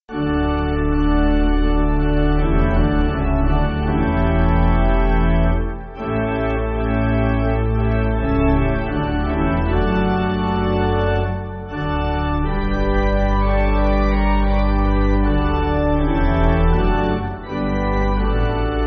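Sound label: Pipe Organ, Backing Files for Churches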